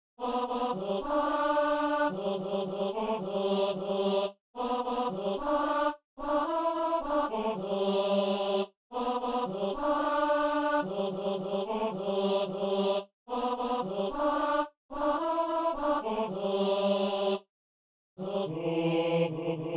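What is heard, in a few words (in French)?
ténor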